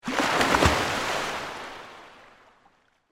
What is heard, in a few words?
Pool Indoor Splash, Water; Pools; BlastWave